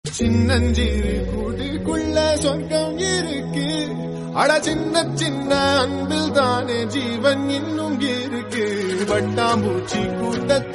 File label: tamil song